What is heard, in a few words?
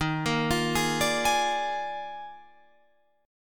D sharp Major 7th Suspended 2nd Suspended 4th, Guitar, Open F; D#M7sus2sus4; arpeggiated